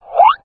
alert, houndeye

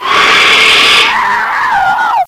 hunter die; hl2

